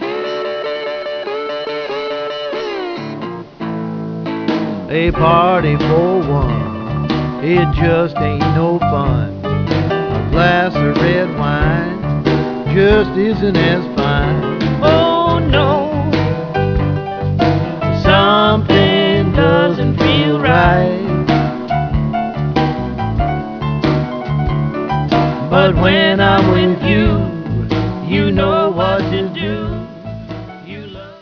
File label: blues; deltaPhonic